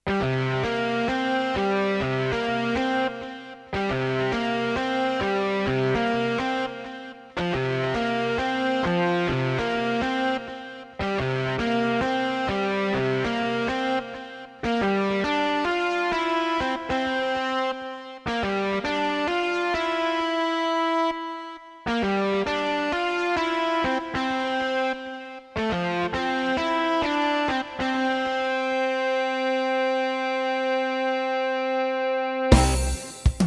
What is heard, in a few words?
The Riff